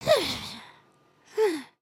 sleep twitch

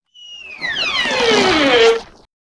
Pet Cat Slide; creatures pets

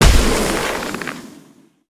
Snowman Smash; destructibles

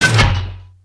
Banana Gun Fire

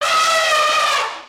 Pets Elephant Happy; creatures; Lego

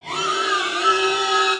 Pet Warthog Howl; creatures pets

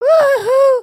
wave, emotes

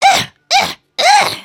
thumbs down; emotes

